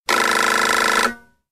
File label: Reed; Nokia E51